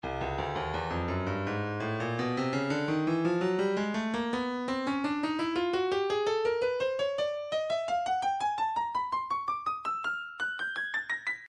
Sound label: Percussion Bongos, midi; Stylus